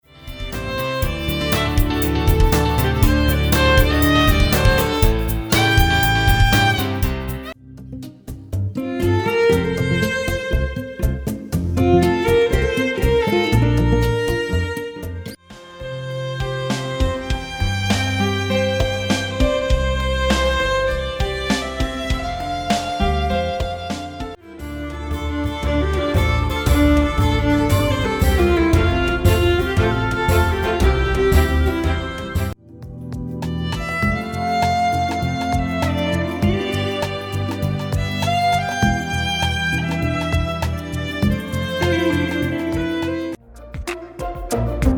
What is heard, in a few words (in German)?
Violine